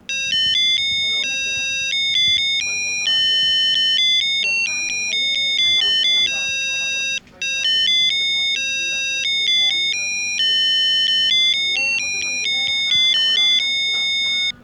congratulation, melody

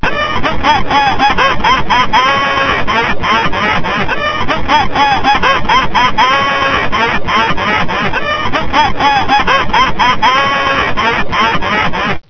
clown laugh

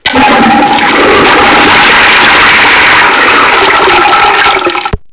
toilet flushing

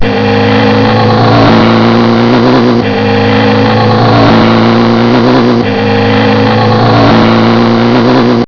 car race

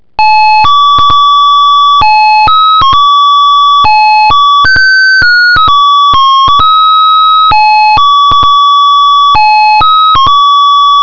wedding march, melody

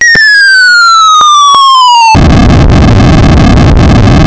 space gun

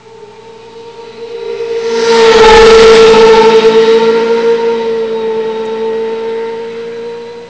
car race

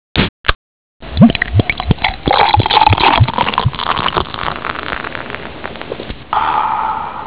drink

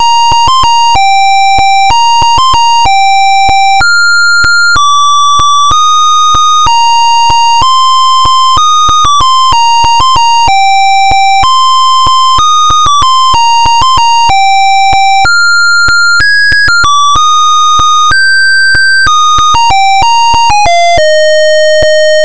silent night, melody

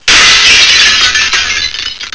glass broken

